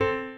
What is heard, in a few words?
piano